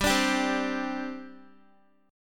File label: G Suspended 4th, Guitar; Gsus4 strummed; x,10,12,12,12,x